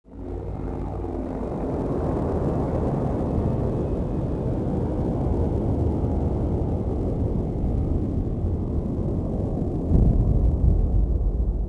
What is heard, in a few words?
Landing Ship; Star Wars Obi Wan; XBOX